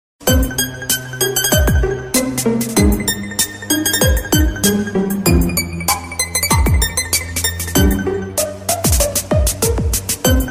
Arabic Music

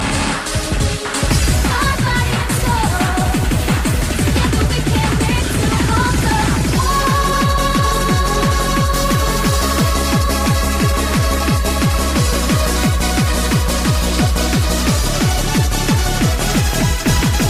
Whole Trance

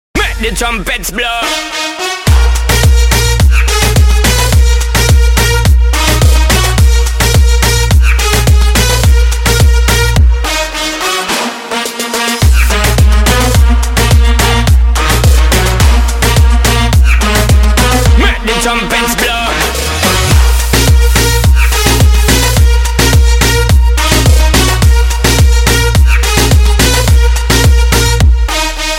Trumpets

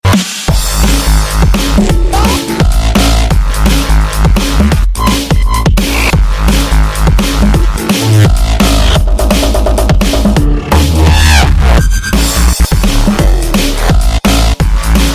Dub; Super Meat Boy

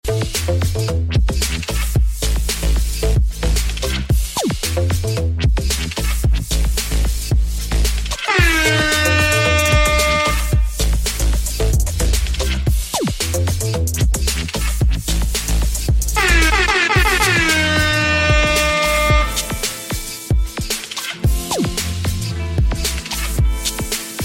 Airhorn; The Floss

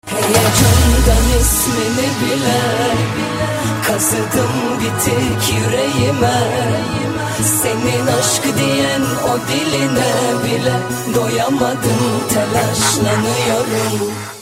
Remix

